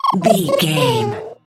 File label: goblin fairy laugh high